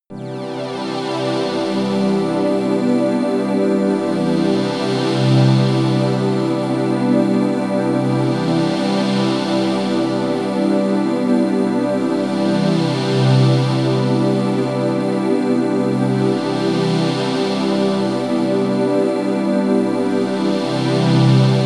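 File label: chrystal air, pads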